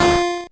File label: core squish